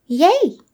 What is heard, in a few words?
yay